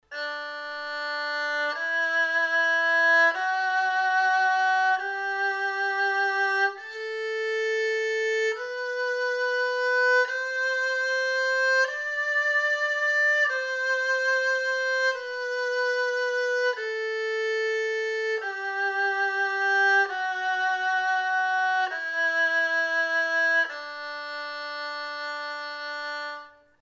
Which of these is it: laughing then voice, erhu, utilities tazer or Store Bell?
erhu